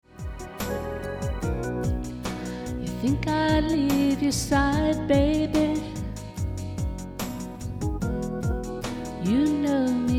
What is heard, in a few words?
Jazz